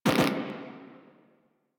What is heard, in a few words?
No Energy; Warframe; Caliber Chicks